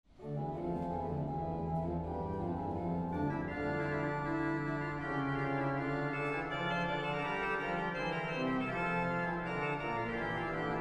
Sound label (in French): impro duo sur les tierces